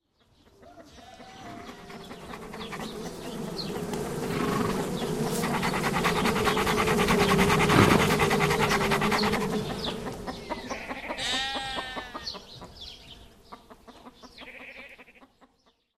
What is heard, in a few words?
Farmer Ambi; Pitch Black Audio Pong